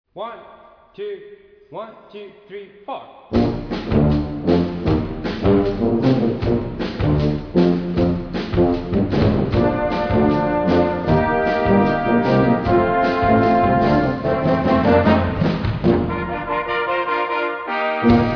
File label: Rock band